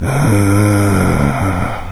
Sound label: zombie idle; doom3